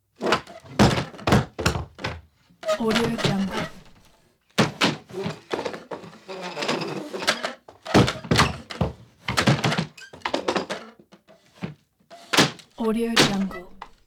bar fight foley wood chairs thrashed around

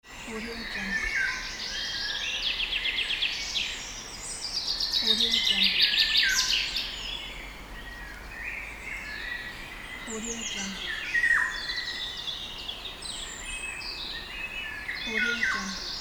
birds chirping; n-audio

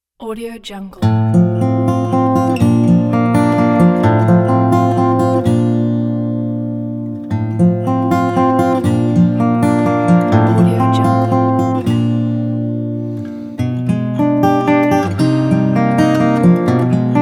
lullaby acoustic guitar solo

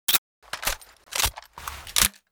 gun reloading designed; soundmorph

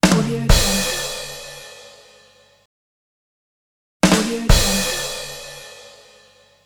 comedy drum roll

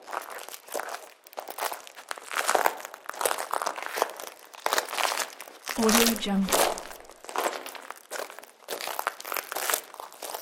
footsteps human walking on broken glass loop; blazznet

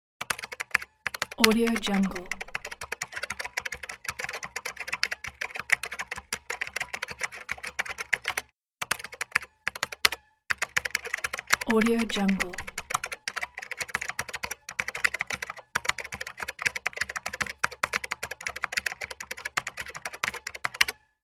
typing

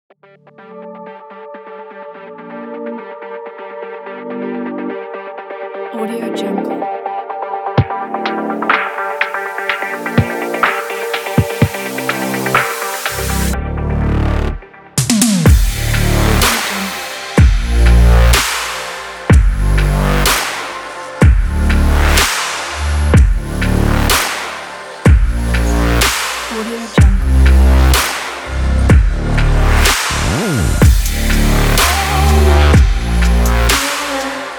stylish electronic

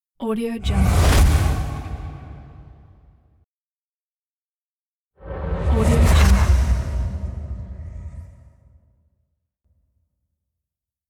super woosh sound pack

